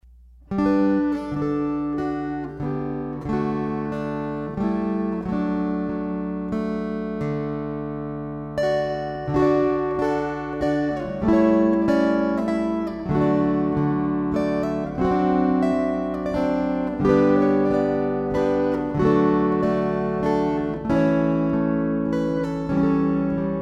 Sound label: String Choir